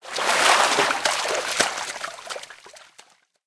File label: fishing catch